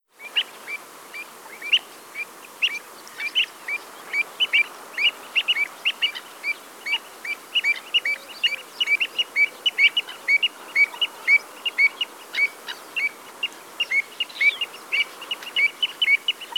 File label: Avocet, birdsong